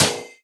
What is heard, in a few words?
Strike